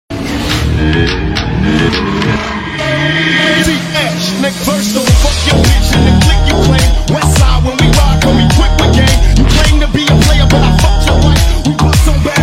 shoti arabi jadidi

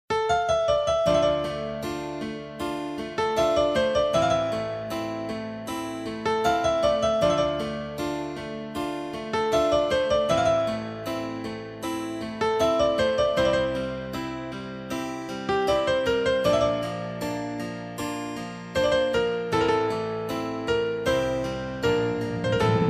Ringtone

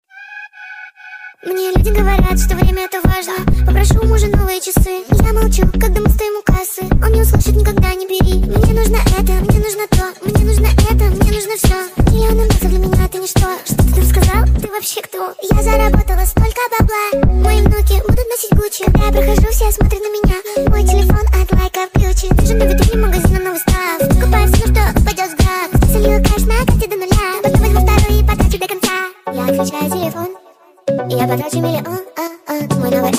speedup

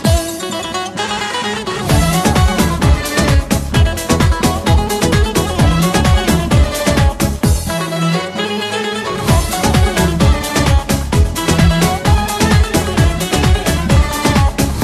ringtone, Turkish

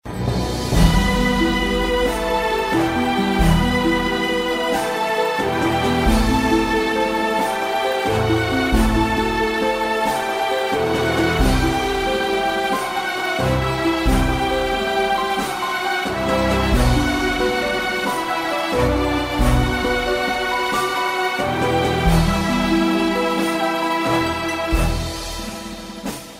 rington

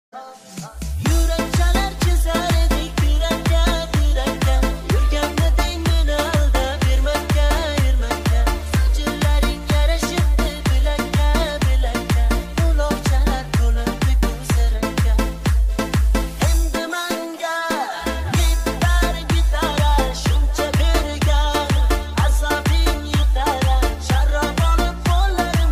remix, gitara

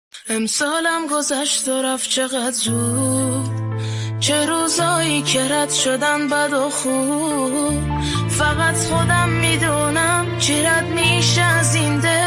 Piano Version

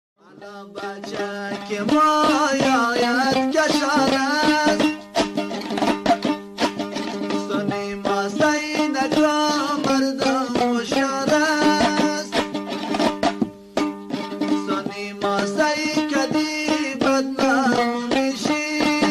afghani

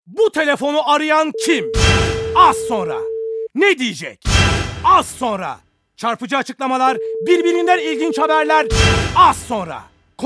Ringtone

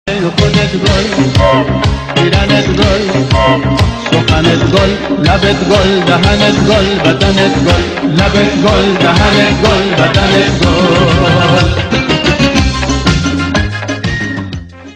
remix

